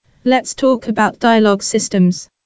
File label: hmm